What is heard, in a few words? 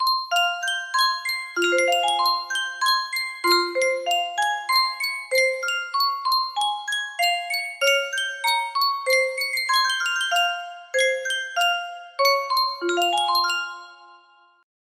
Sankyo music box